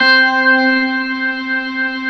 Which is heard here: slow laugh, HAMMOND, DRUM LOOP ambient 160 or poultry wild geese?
HAMMOND